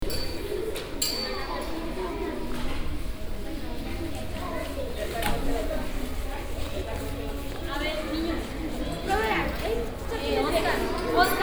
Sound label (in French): bogota tintal groupe enfants